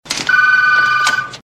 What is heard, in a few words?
digital phone ring